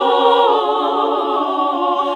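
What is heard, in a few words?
Classical Sing, choir; Best Service Gigapack 1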